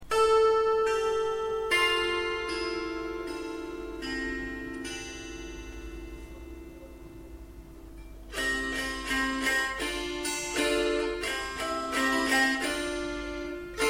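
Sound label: gusli ringing; gusli zvon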